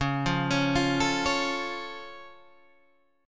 C sharp Augmented down Guitar Open G, chord

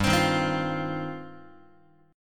G 7th Suspended 4th Sharp 5th, Guitar; G7sus4#5 strummed

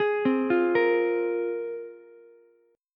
Bb 7th Suspended 2nd Bb Ukulele, chord; 13,12,13,13; Bb7sus2 arpeggiated; JGuitar

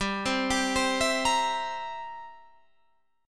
G 6th Suspended 4th Sharp 9th; G6sus4#9 arpeggiated

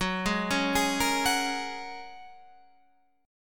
G sharp 9th Suspended 4th F sharp

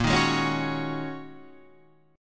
Ab 7th Suspended 2nd Suspended 4th, chord

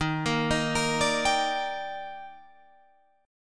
Eb Major 7th, Guitar Drop D

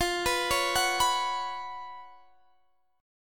F sharp Major 7th Suspended 4th

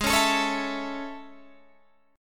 A Major Ab; A/Ab strummed

